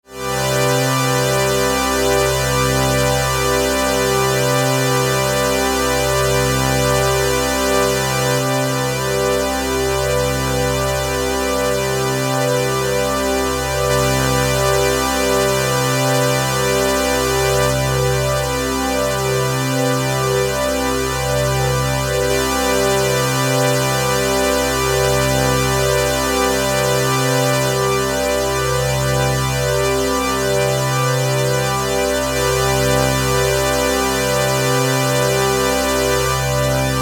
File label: subtle chorus various d and t modes, synth; jurgen Haible